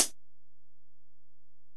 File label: HiHat, Alesis DM-PRO